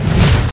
Normal Attack; Firestone Idle RPG; solaine

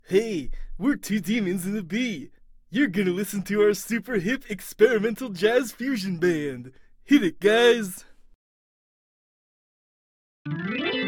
Jazz Organ; Rick and Morty: Virtual Rick-ality

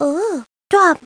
drum

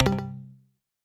Musical Click; neko journey

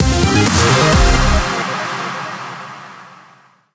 Level Complete; nitro street run

